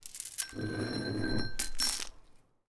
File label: Footsteps Chain